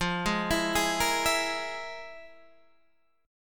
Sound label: F Minor Major 7th Double Flat 5th, Guitar, Standard; FmM7bb5 arpeggiated; JGuitar